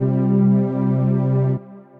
Pad, Synth Pad; Roswell Pad; Ableton; Beat Tools

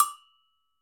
Agogo High, Latin Percussion; Drum Hits; Bell; Ableton; Factory Packs

